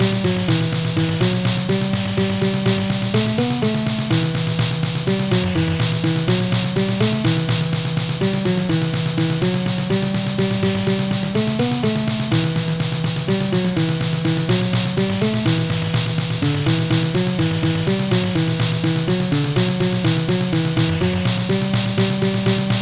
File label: hardcore street; Sonix Music Driver